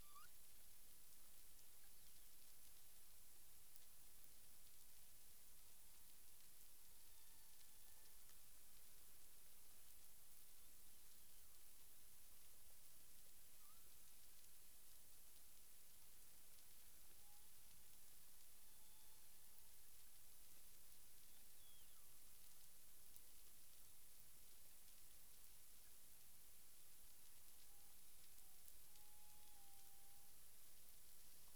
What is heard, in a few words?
humpback whale; CARIMAM